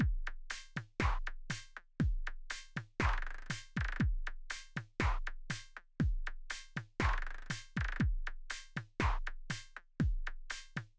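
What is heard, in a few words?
with drums synth conv 250ms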